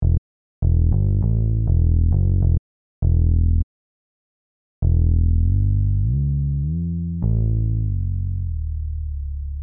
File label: fretless bass dry